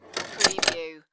Pinball sound, ball roll with metal levers switches